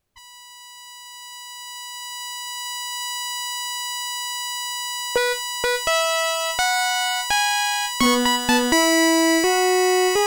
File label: SB CX4235 Spkr Out; Configured for Sound Blaster.; Crystal 3D Sound (CX4235); Space Quest IV: Roger Wilco and the Time Rippers